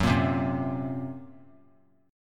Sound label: F sharp Major Flat 5th F sharp; F#Mb5 strummed